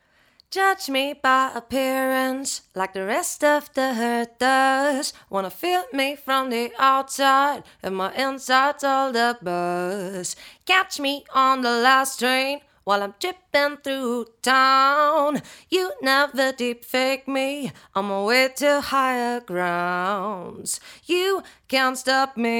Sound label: fem voc clear tube